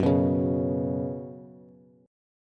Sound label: Gb Suspended 4th Gb, down, Bass; strummed; JGuitar